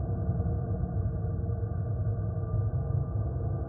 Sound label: spin Start Portal; netent